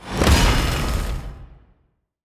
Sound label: Monster; Zenless Zone Zero